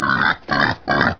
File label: pig